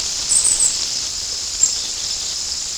chickadee, Black-capped Chickadee Parus atricapillus